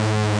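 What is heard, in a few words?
invader, VIDEO GAMES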